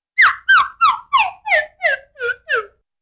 jerky descending whistles

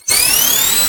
obnoxious spindash; sonic

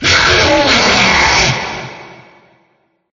jumpscare sound; chucks holiday horrors

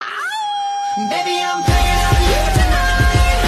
werewolf auuuuu

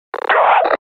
hit; combine

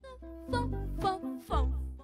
fon fon slow

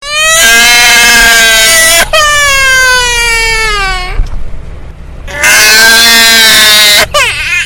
crying baby sound

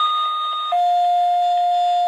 pager

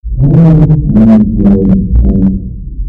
bassboost

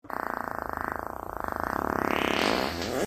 marble fart

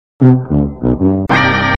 fail sound

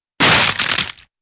doubled wood crunch

